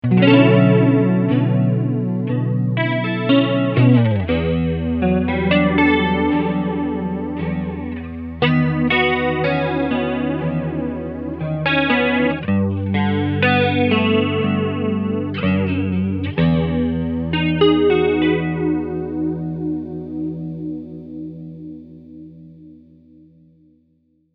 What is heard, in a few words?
flanger; Mobius